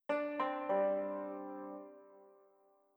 yanquin; Netease

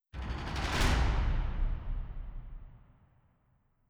taiko rolls; Netease

